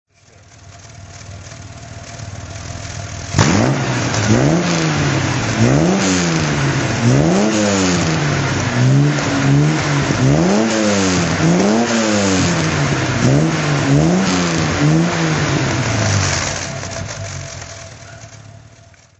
aston db4 throt

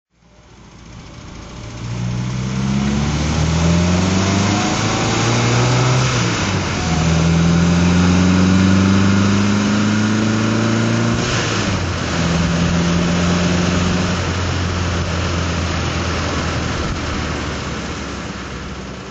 mga drive

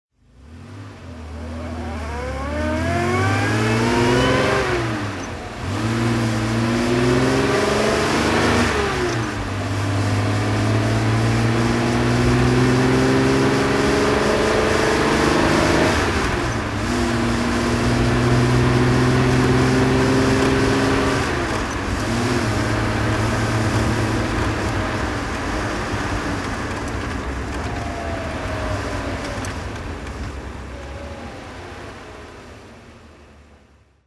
austin healey 3000 mk3ph2 driving

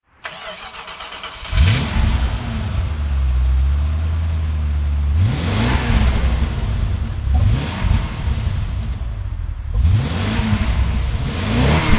aston martin V8 vantage volante throttle

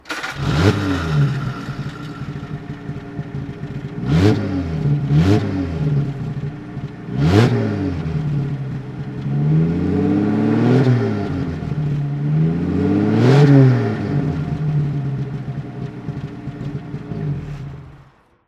citroen traction 15cv throttle; Citroën 15/6 Traction Avant, 1939; Engine sound; V3D/ ClassicarImages, Marc Vorgers

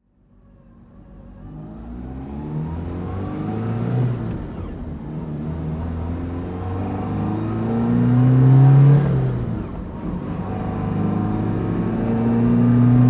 alfa romeo giulia 1600 rally driving

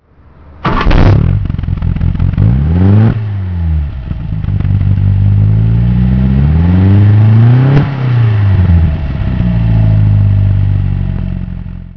mga 1600

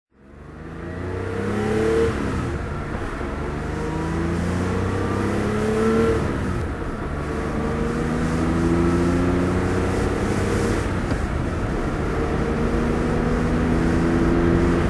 porsche 911 2.2 s driving

